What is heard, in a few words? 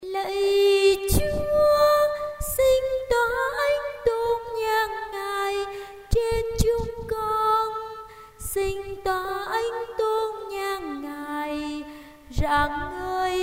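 soprano alto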